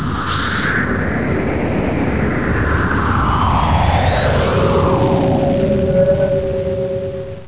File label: drop